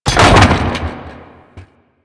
Debris Metal Wood; ambience; Warframe; Entrati

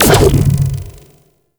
Cluster Shot Launch; Enemies; Abilities; Warframe; Teralyst; Rainalyst

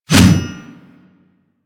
Mace Whoosh; Warframe

